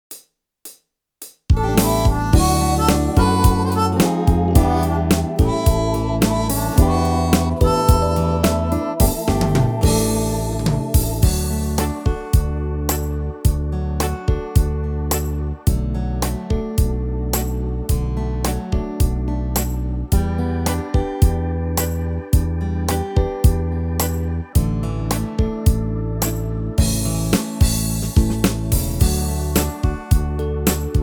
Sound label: D Major 108 Bpm